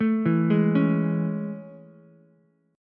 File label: A Major 7th A, Ukulele; AM7 arpeggiated; JGuitar